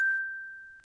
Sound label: Timer